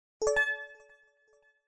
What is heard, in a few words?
warntone; Huawei C6110